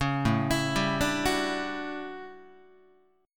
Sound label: A 13th A down Guitar; arpeggiated